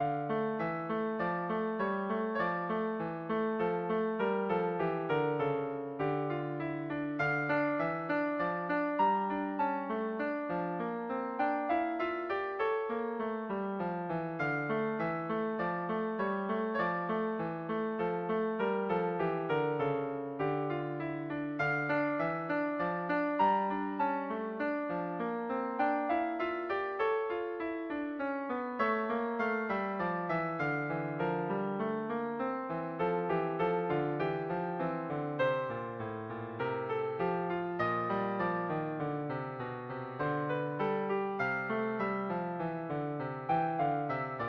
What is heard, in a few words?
menuet